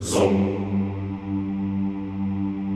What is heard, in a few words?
ZOOMMM G1, Classical Choir; Best Service; Extended Classical Choir